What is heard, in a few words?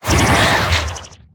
Pain Light; Evolve; Wraith